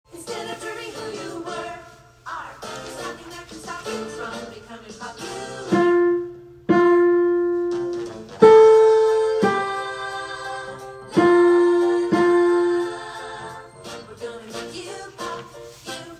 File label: 2nd Sopranos